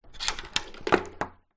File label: household door chain slide unlock swing hit wall